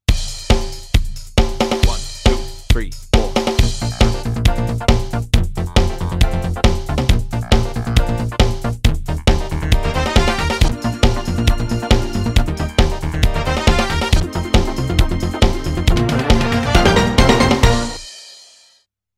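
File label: tongue test Bb @136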